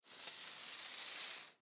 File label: Chalk Sidewalk Draw On Cement; Chalk Sidewalk Draw On Cement Long Stroke; Writing; Foley; Blastwave